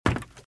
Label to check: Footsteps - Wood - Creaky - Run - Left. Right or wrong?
Right